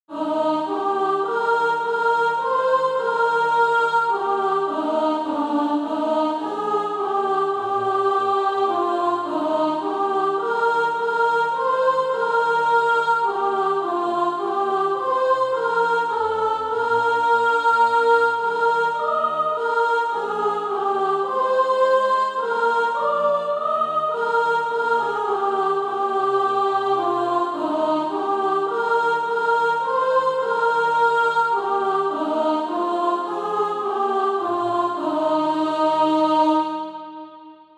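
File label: soprano, SATB Practise Music; Disciples of All Nations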